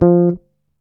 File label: DOPE NOTE; E-MU Formula 4000 Series Vol. 1; Hip Hop Nation; Big Bottoms